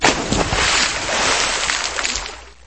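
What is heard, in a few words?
splash and very many sprays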